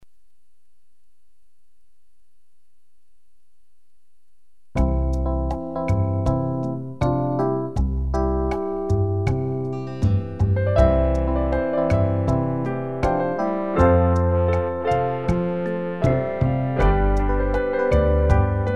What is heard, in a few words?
Slow Dance